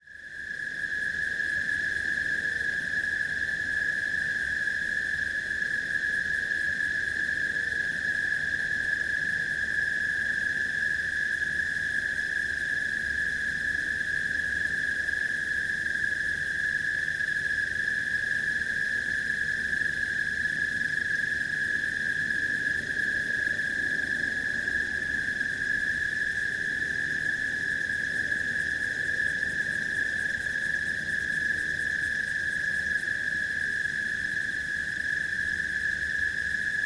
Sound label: Crickets at night